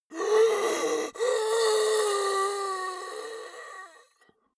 monster die; kreaturen

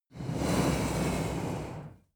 reptile breath avarage soft large; kreaturen

